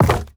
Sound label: wood drop; ui; inventory